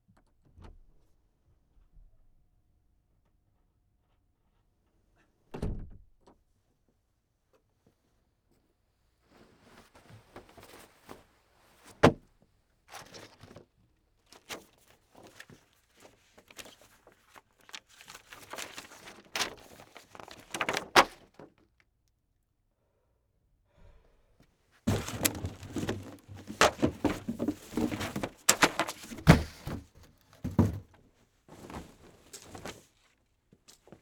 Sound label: Human Rummaging Through Stuff